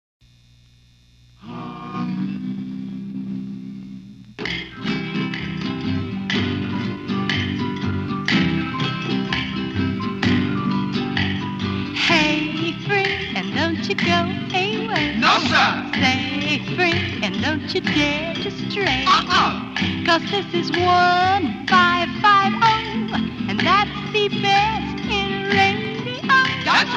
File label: jingle; don't go away 1550